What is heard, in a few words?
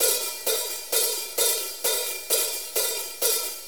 4-4 HiHat Loop